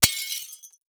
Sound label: Impact Window Pane Smash; Impacts